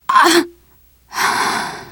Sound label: death scream, teen girl; player; survivor; voice